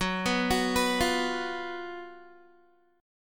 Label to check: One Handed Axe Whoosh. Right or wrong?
Wrong